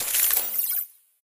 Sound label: map close; ui